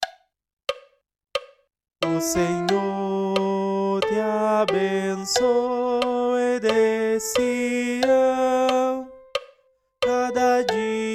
Coro

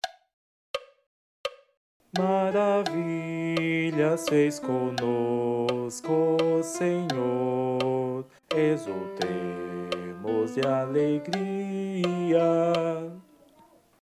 Advento coro; 2 dom

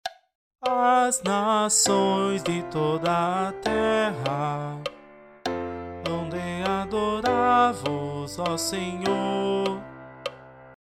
Coro Epifania do Senhor

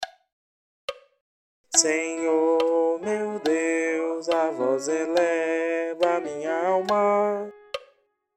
Salmo Advento coro

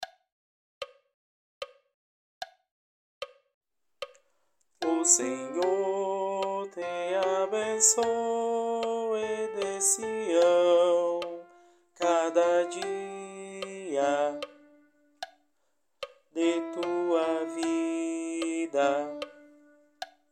salmo 127 coro

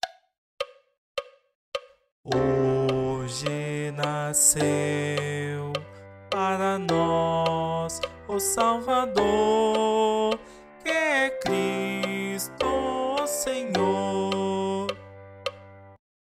Coro

